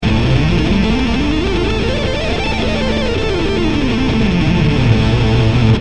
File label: locrian fast